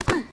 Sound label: Street Ball Hit Body; SFX Kit